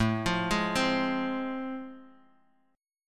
A Minor Major 7th Sharp 5th A Guitar Drop D, chord